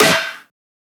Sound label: snare disto; one shots; SEISMIC Drum Sample Pack; Slate